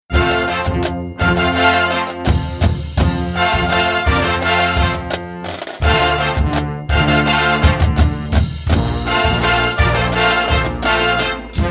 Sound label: Marching Band; Publisher: Hal Leonard